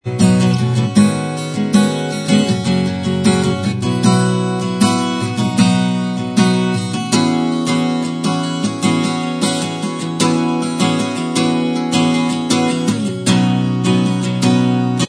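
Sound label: acoustic style, instrumental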